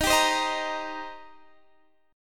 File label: Eb 6th Eb down Guitar Standard